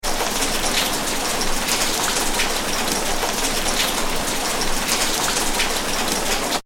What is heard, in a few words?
weather rain med drips street, Rain (Medium) With Dripping; Rain ( Medium ) With Dripping On Hard Surface 3; McKinney Sound